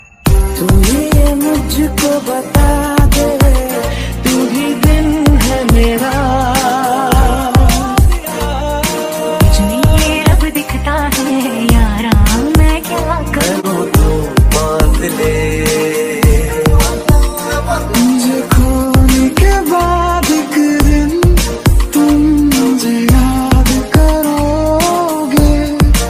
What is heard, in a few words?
ringtone, bollywood ringtones